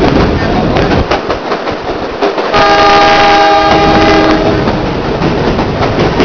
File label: train; tropics